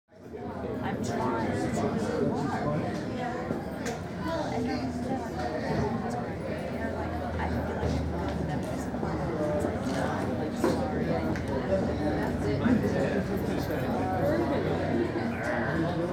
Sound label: medium crowd busy; Asian Show Sound Effects